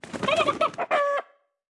Chicken, 部署音效; 母鸡; 部署音效 dep 在角色详情页面点击初级、经典、高手和顶尖形态选项卡触发的音效; 卡通农场; 绿野世界

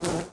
beehive atk hit; 蜜蜂; 贝亚; 沙漠世界; 荒野乱斗; 蜂巢

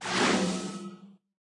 Witch base atk; 巫术球远程攻击; 攻击音效 atk 初级和经典及以上形态攻击音效; 女巫; 部落冲突; 皇室战争

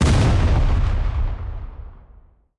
barrel explosion; 爆破麦克; 绿野世界; 荒野乱斗

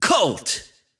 Colt intense

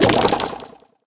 tree die, 树木被砍倒时触发; 技能音效 die; 格雷格; 绿野世界; 卡通农场

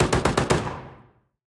Heavy base super atk, 攻击速度提升时的攻击音效; 技能音效 super atk; 重机枪; 重机枪手; 海岛奇兵

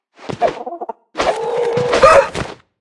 Ultimate Chicken, 动作音效; 母鸡; 绿野世界; 卡通农场; 敏捷型近战单位; 在广场点击初级、经典、高手、顶尖和终极形态或者查看其技能时触发动作的音效

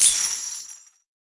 Goblin Evo, UI音效; 在角色详情页面点击初级、经典和高手形态选项卡触发的音效; 哥布林; 绿野世界; 部落冲突; 皇室战争

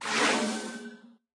Witch baby atk; 巫术球远程攻击; 女巫; 部落冲突; 皇室战争

